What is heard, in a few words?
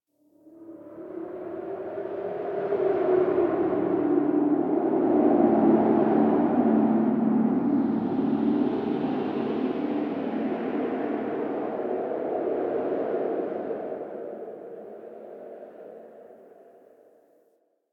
Dark Wind Misc; Ambience; Warframe; Nineteen Ninety Nine